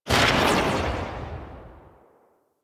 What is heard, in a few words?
Cowgirl Pistol 2D Tail A; Tenno